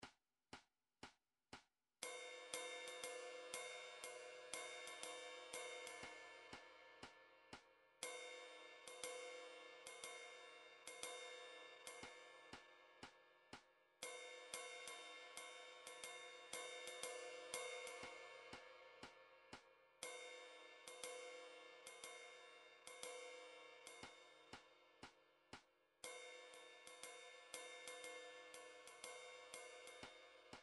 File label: ride; rumtrax library